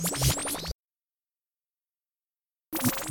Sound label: Blleepp